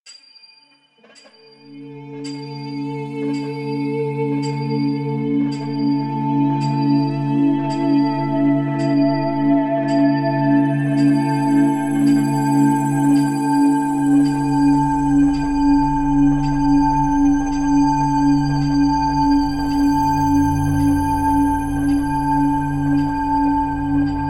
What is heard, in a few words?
synth 19edo harm series; forging an insect; simultaneous tunings